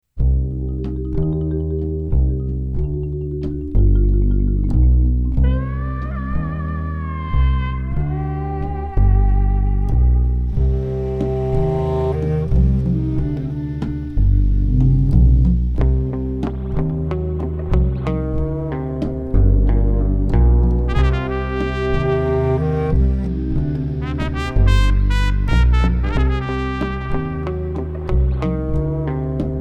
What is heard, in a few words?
Cowboy music